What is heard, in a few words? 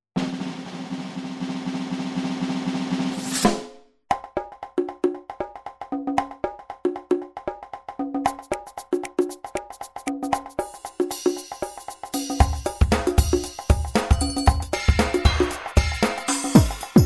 Alesis DM5; drum machine